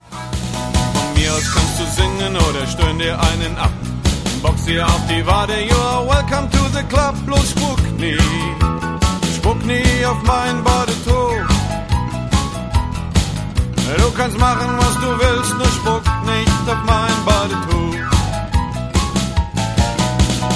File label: Sauna Blues